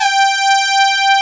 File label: BR G4, brass; AKAI S-Series CD-ROM Sound Library VOL-8